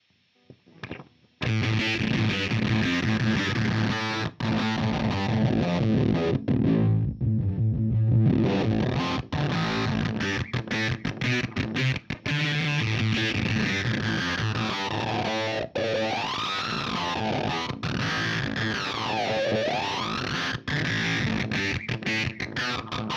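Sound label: filter freq res modern, Filter Fuzz Effektpedal; Walrus Audio Kangra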